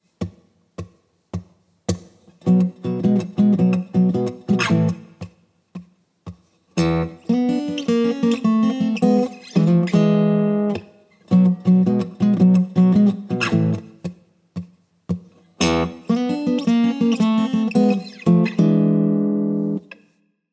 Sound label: Blues Guitar Turnaround